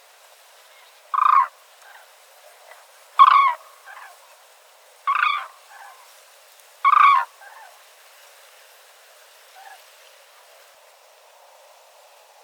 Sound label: call, Grus grus, Crane, flight calls, the far seven Cranes on ground call and the flying birds answer